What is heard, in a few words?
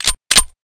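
slide release, deagle; vp70; sven; cof; guns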